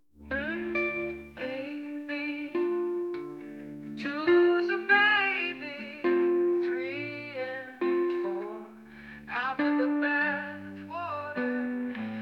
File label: Unplugged